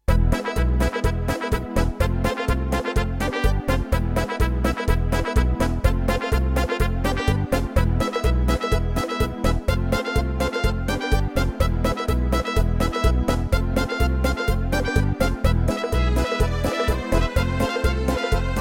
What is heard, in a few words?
Instrumental